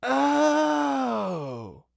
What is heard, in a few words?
Human Male Ooh Surfer Ooh